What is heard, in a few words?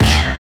Bounce; Roland BK-5